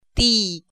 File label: tone